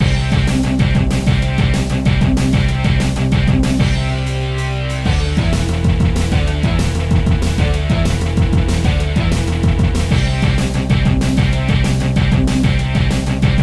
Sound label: guiter sample